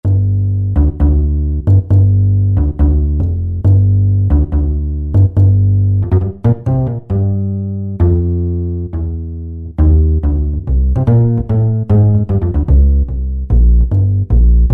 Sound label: Wood Bass; Yamaha EX5R; Factory presets